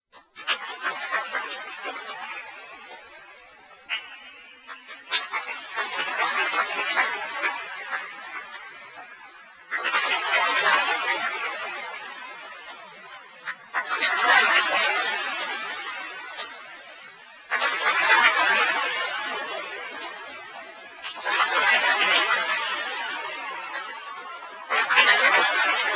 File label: applause